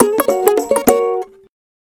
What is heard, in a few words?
Game Over, notifications; LG G3